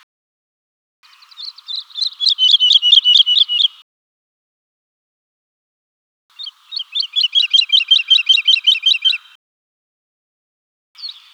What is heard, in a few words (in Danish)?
Hedelaerke; Biodiversitet